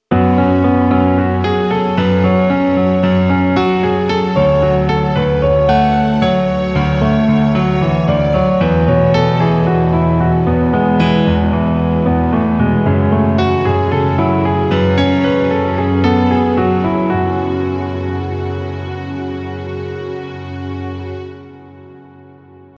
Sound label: piano